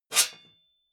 metal sheet scrape fast

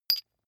medium metal impact hard

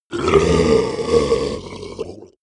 ogre die; kreaturen

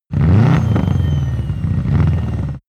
car subaru impreza rev up

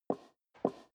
step high heels wood creak scuff; schritte

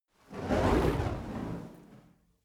fire torch whoosh long soft; swooshes-swishes

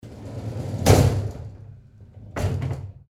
door sliding close

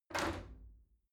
shower slide door open hit soft

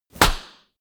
clap, percussion

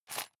sundries shake soft; berufe; handwerker; soundpool

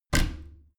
bathroom cabinet both close heavy; objekte; tueren

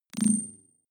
data transfer tonal, signale; soundpool

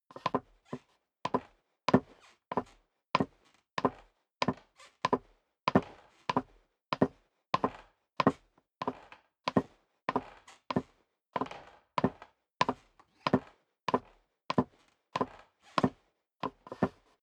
step dress boots wood creak downstairs sequence; schritte; menschen

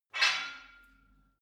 barbell weight rattle medium, fitnessstudio; sport freizeit

